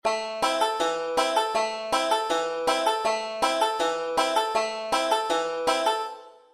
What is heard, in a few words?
am alternating bass, banjo; beginning 5 string